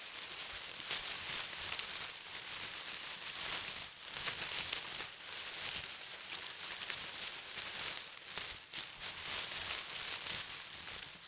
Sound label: bushes, rustle; ambient; World of Tanks Blitz